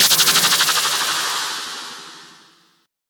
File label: Impacts